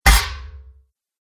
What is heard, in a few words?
Sword Slashes Plate Mail; Sword Sound Effects; combat